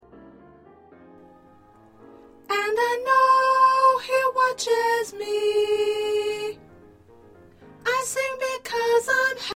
1st Soprano

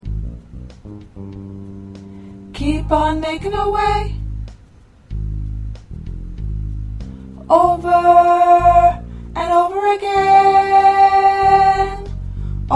Alto, choir

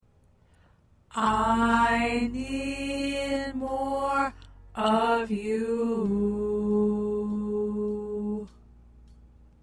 Tenor

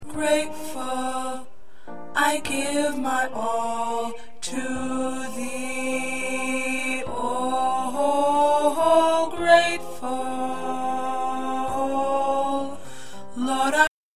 Alto, choir

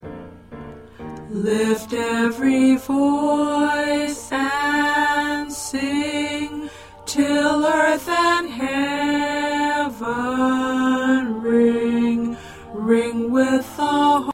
Tenor, choir

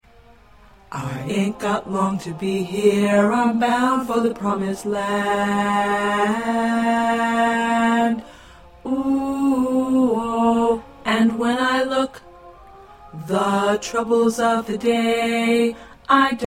Tenor 2

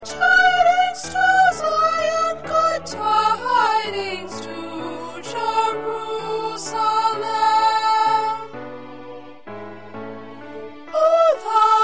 Soprano, choir parts

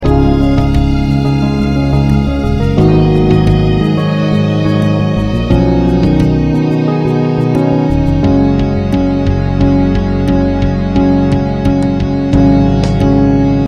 Accompaniment

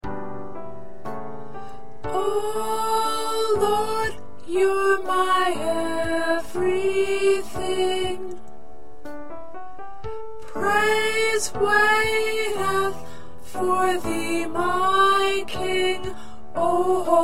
Soprano

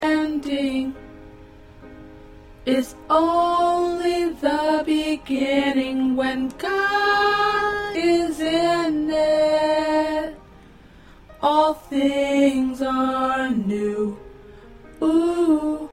Alto, choir

